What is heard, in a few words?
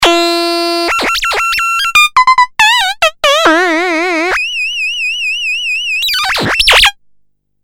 bended